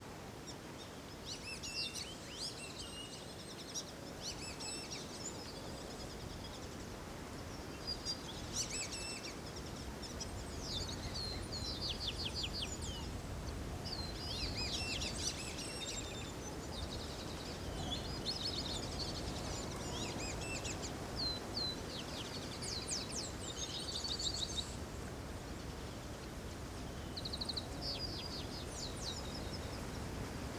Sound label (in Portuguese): Varias marrecas caboclas em laguinho em terra ronca com vento nas arvores; aves; canais stereo